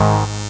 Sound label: SYN G, BUZZ SYNER